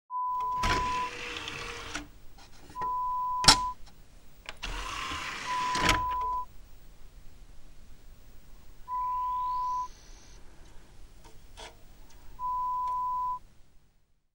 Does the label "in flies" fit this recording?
No